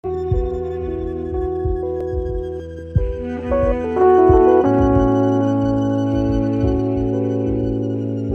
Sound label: electronic